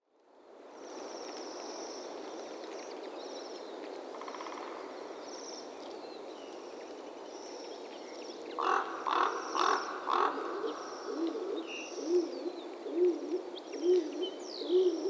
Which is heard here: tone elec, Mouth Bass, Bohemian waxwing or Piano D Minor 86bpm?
Bohemian waxwing